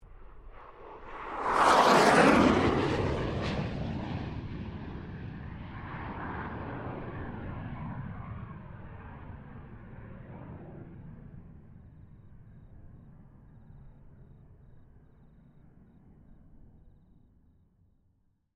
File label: AIRCRAFT FA-18 HORNET PASS